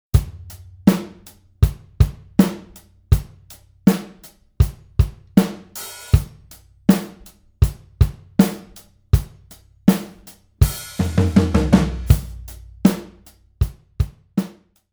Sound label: drum comp